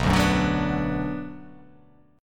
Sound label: B Minor B